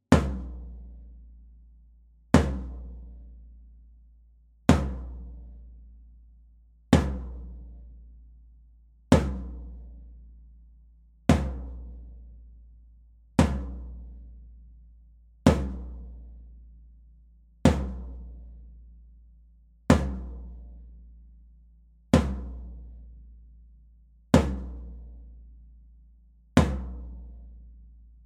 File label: Floor Tom single